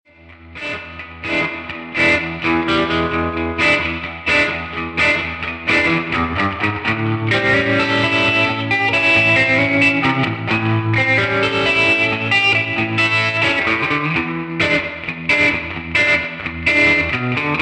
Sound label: Black Lux with 1x12 Black Panel Lux and Cond; Swampborn 64; Cond 67